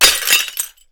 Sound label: Glass, Shards, Smash, Medium Impact, Lots of Large Shards; Shards Broken Glass; Chris Skyes